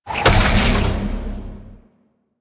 Weld Finish; Warframe; DE; Vehicles; RailJack; RailJackHazards

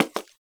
Human Loid Foley Step; Characters; Warframe

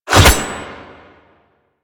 Machete Whoosh

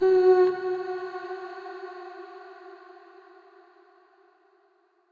Statue Voice F Sharp; Player Instrument; Statues

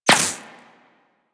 Laser Impact Default; Warframe; Weapons

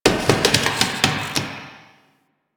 Derelict Metal Creak Small; Warframe; Orokin Derelict

